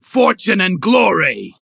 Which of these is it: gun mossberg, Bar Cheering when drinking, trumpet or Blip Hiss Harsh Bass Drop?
Bar Cheering when drinking